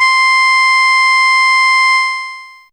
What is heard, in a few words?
Mouth C5, Humans; Fairlight CMI IIe; Humans1